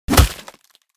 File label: punch